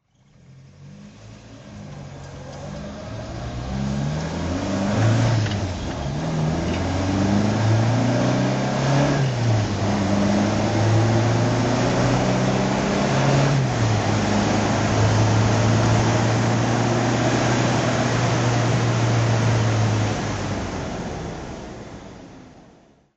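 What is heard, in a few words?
alfa romeo gtv 2000 drive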